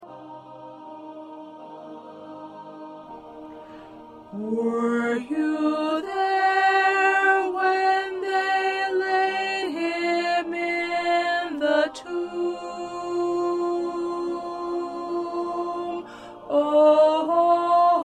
Soprano 2

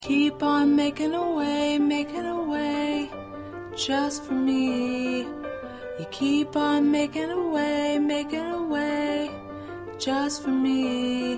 Lead

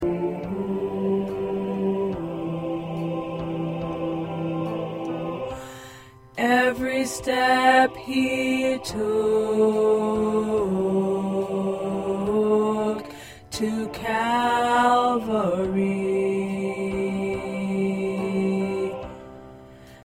Tenor

